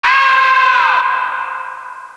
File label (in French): cri